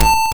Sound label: YM Synth A; SYNTHS; CHIPSHOP DELUXE; CHIPSHOP HITS